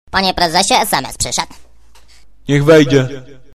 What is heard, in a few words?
Sms Tone